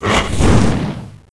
lost soul; garrysmod

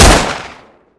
beretta; garrysmod

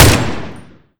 g3a2

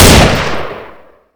tavor; garrysmod

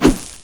stun swing; garrysmod

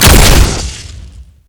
gauss rifle; garrysmod

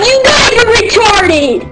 baby rocket; garrysmod

